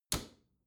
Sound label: bathroom cabinet close regular